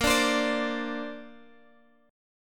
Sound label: A sharp Major Add 9th, Guitar Open G